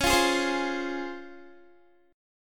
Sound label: C sharp Major 7th C sharp Guitar; C#M7; strummed